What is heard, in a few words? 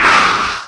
attack growl, bullsquid